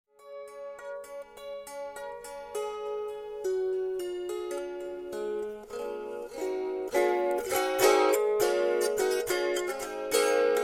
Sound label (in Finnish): Teräskielinen kantele